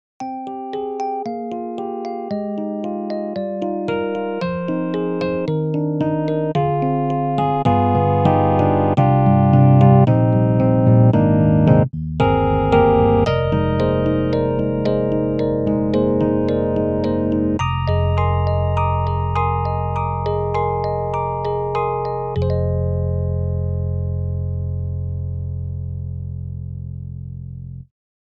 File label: EDIROL Super Quartet Retro Rhodes